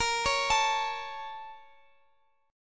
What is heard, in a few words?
Bb Minor Major 7th, Guitar, Drop D; arpeggiated; x,x,x,15,14,17